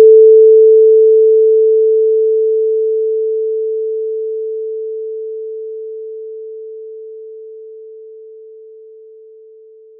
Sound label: pass 440